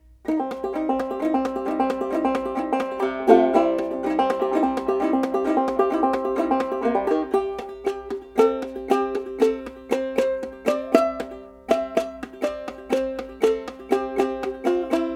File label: nylon